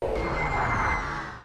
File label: davy launch, nuclear